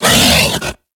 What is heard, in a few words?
Feral Vocal Melee Attack; Enemies; Kubrow; Warframe